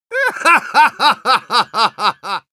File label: laugh happy, ru